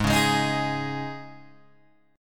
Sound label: G sharp Suspended 4th G sharp, Guitar Open G